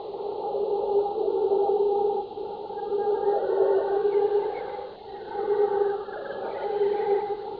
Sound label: jungle loop